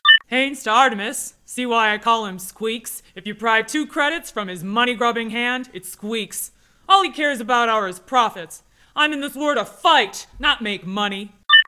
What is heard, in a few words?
it squeaks; Haynes; Artemis 2.7; HamakSector